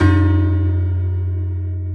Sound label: Chime, e2; BGM; Nintendo; July 2020 Gigaleak